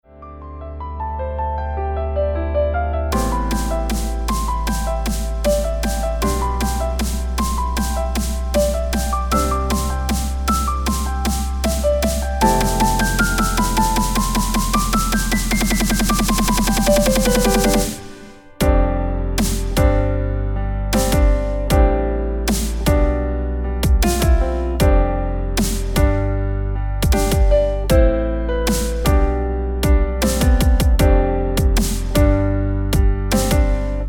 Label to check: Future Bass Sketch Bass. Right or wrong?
Right